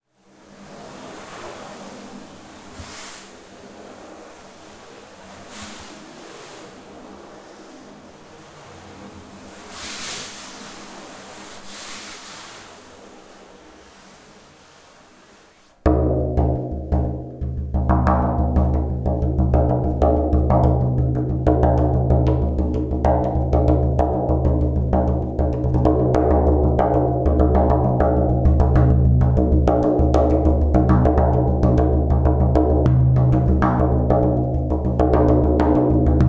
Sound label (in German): Rahmentrommel Reiben Trommeln